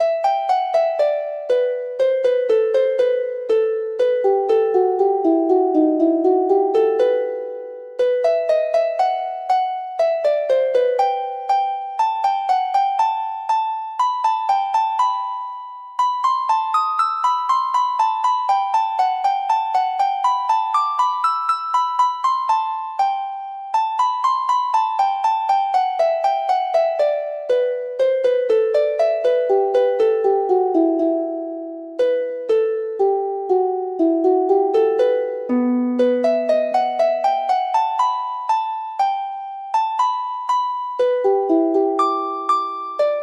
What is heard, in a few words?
harp